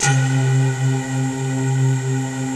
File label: FLUTTER PDS; Pro-Rec - Synthsonic